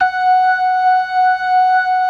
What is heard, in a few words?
LOW MAN, LOWER MANUAL, Organ